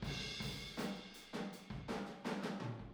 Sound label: Boogaloo Fill Room ST, 82 BPM, Drums